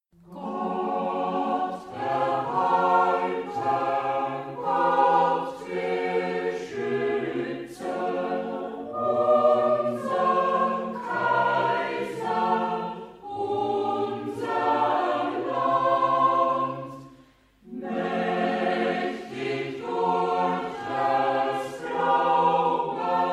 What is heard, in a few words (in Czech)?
hymna nemecky